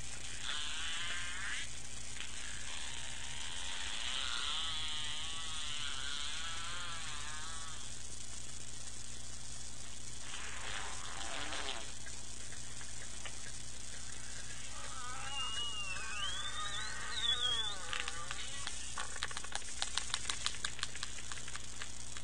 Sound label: zerbert mystery clip, SRKW whistles; biophony